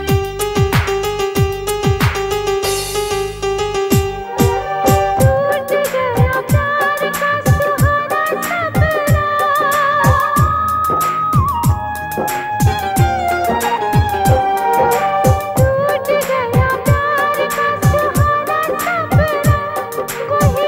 Old Hindi Song Ringtone

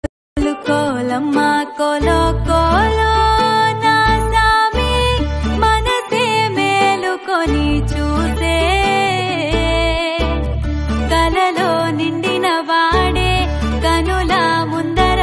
Telugu; Virata Parvam

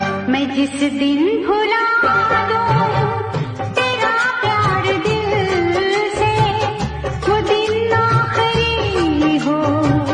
Female Song

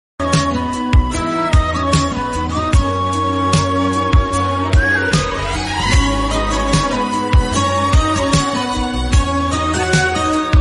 Telugu Instrumental Ringtone; Fidaa; Telugu Movie

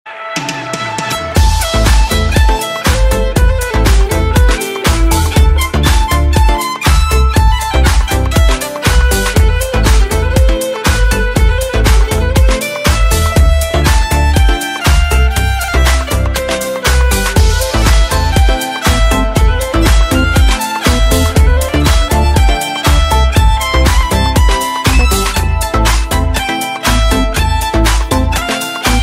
Violin Instrumental Tone

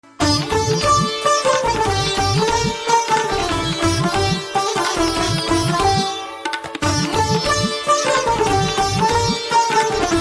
Veena BGM Ringtone

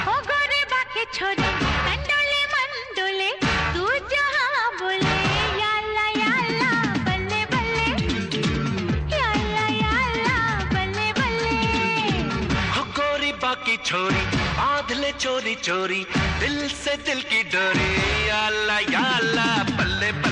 Song Ringtone

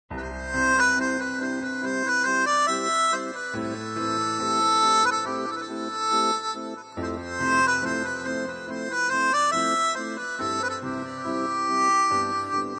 Instrumental BGM Ringtone

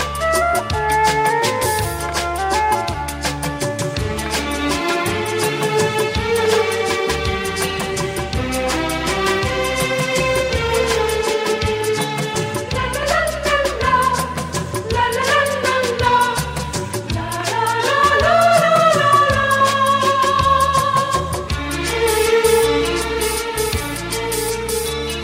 Instrumental Ringtone

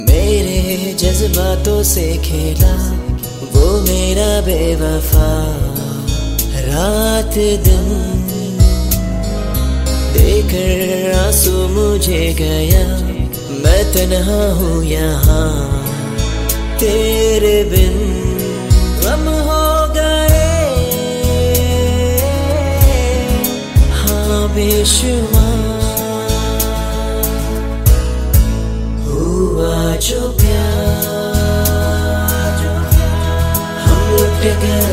Sad Ringtone

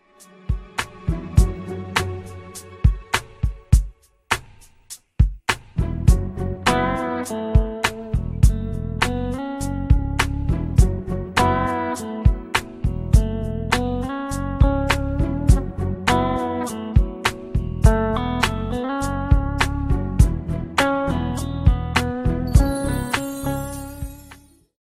English Ringtone

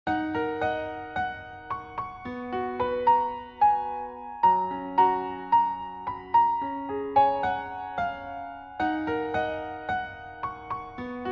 Piano Instrumental Ringtone

